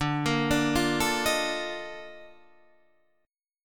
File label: A sharp Major 11th D